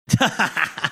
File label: cackling